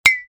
glass

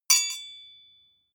sword hit ring soft rattle

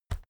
keyboard external key push

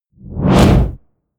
push

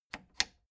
plastic button latch loose click disable slow

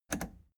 plastic button scratch click enable fast

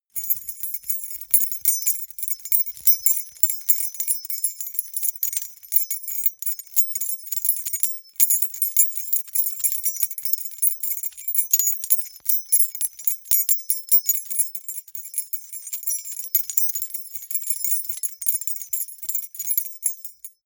key ring small rattle air sequence hard

